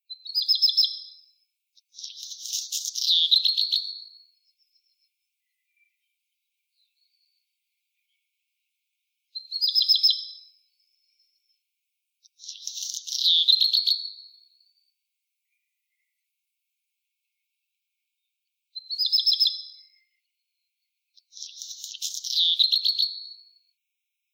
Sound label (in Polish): kopciuszek zwyczajny Phoenicurus ochruros